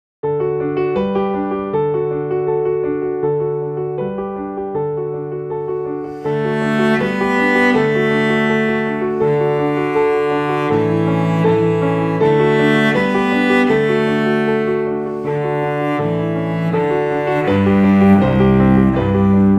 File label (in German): Playalong cello klavier